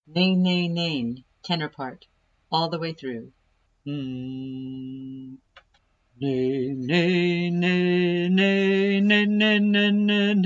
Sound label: tenor; Neyn